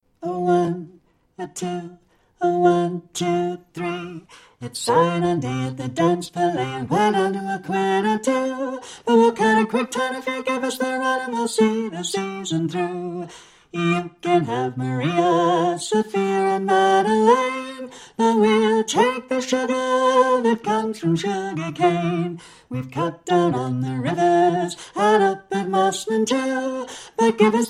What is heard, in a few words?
melody in octaves